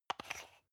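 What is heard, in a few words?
toothpaste close hard, Bathroom; haus-garten; badezimmer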